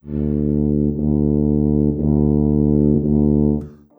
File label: Brass, Orchestral, Rock Pop